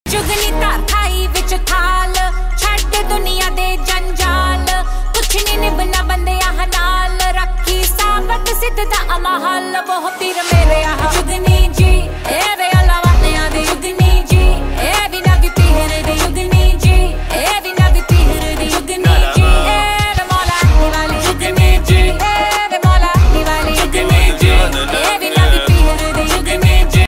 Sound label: Punjabi Beats Ringtone